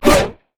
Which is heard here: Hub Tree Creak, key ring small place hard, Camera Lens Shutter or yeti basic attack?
yeti basic attack